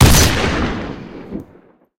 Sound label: ns45 singleshot, guns